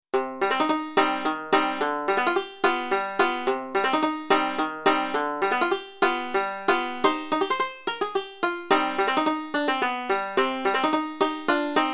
Spanish Dance

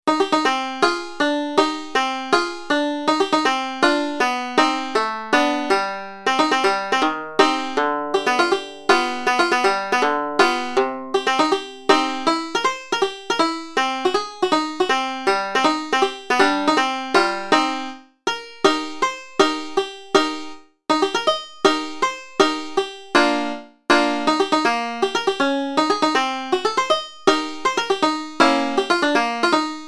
Ethiopian Shuffle

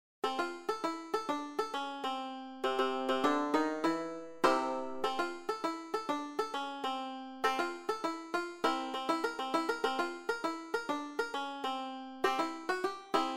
Calliope Rag

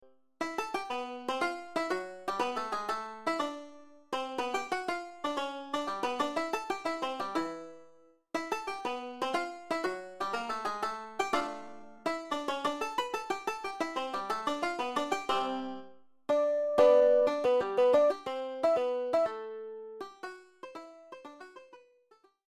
Alabama Barn Dance; first section

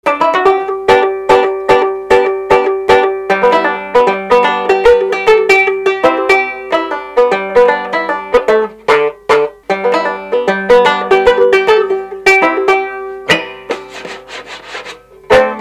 Banjo; Banjo Capers

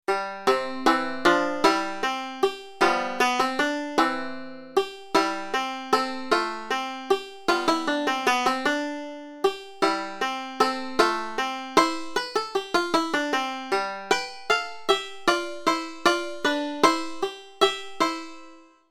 Menuet

